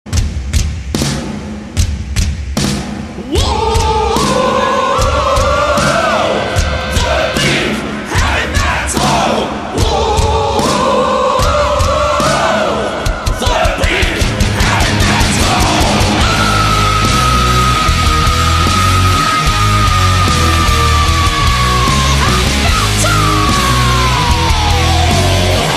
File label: The Big Heavy Metal